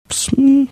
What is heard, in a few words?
making marsupial cooing sound